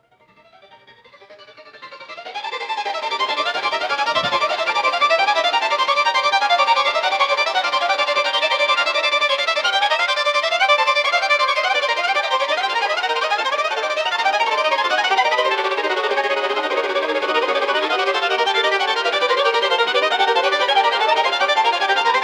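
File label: increasing pace and momentum, strings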